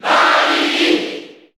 Cheer Japanese; SSB4; SSBU

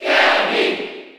Cheer French; Kirby; SSB4

